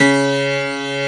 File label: STUDY PNO; East West; Global Sounds